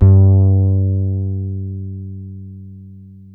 HOLLOW FRTLS G#3, Bass; Spectrasonics; Bass Legends

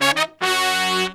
120BPM, Horns

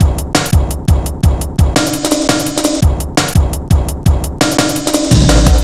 tanked up, Drum Loops, Drum&Bass, 170; Total Drum&Bass

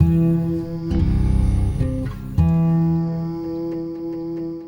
Guitar And Synth

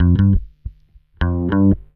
bass, roots funk; USB Soundscan vol.41 - Roots Funk; AKAI

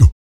VOC PERC, Vocal Planet Jazz FX BASS PERC

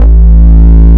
DIGI BASS; DIGI BS

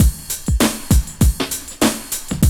Loop, Roland; Sound & Vision - Gigapack I

